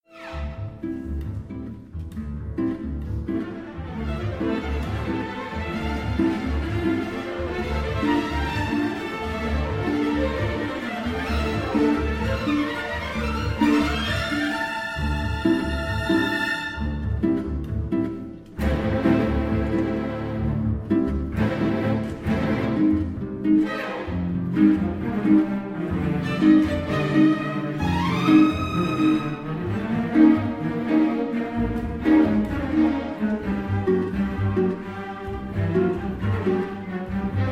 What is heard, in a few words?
for Harp, Bandoneon and Orchestra; Arranged by Daniel Binelli